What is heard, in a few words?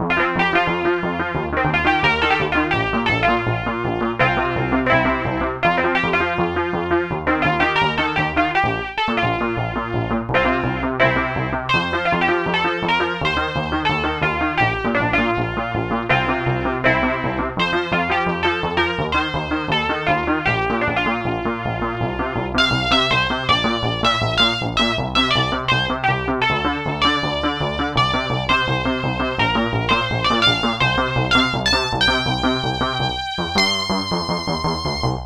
reface cs; yamaha; mess arounds